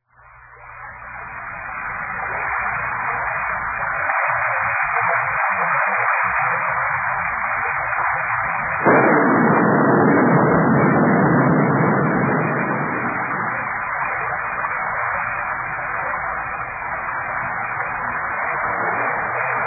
thunder rainy

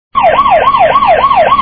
siren short

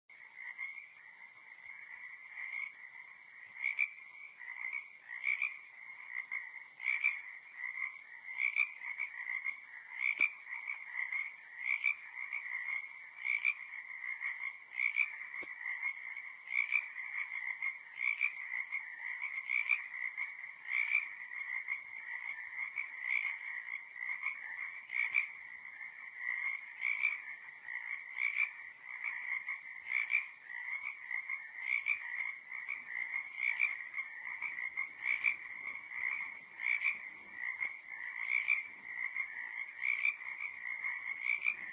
frog call

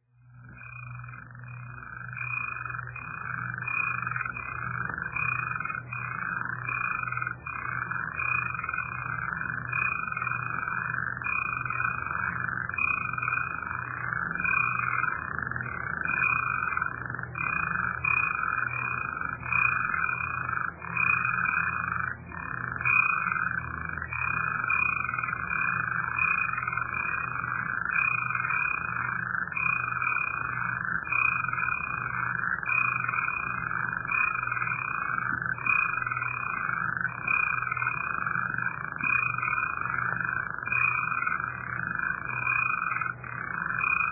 froggy sounds

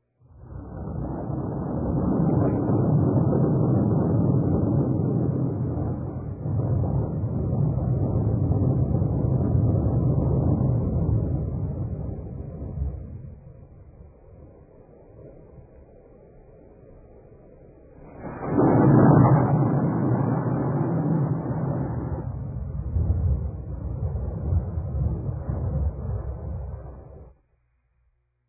thunder roll

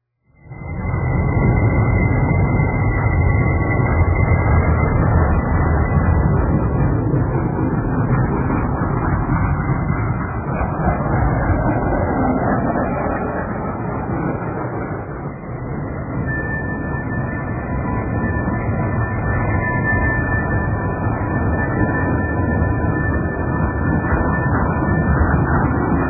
san fran cable car

